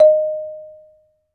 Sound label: MARIMBA; ILIO